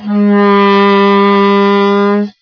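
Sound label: electric horn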